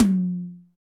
Large Room Tom One Shot F Key, Royality free tom single hit tuned to the F note; tom drum samples; one shots